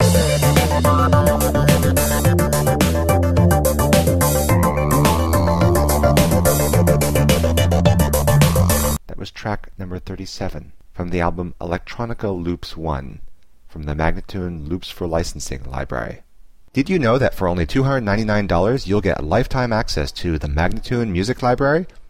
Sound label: 107 D spoken; Electronica Loops 1